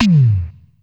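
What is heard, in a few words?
tom, Casio CTK-5000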